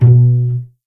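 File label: B1 pizzicato cello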